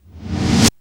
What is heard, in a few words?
REV SNARE; Back In Time Records; Big Bang; SNARES